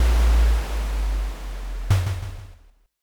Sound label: drawbridge